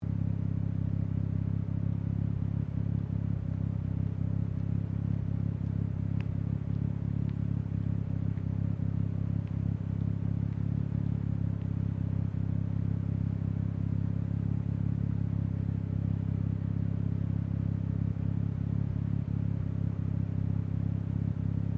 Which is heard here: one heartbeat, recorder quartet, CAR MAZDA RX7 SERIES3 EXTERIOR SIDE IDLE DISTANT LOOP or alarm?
CAR MAZDA RX7 SERIES3 EXTERIOR SIDE IDLE DISTANT LOOP